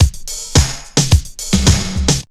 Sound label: house loop